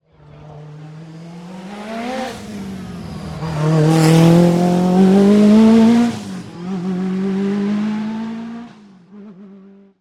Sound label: Rally Car-Straight Uphill Pass By; Rally Legend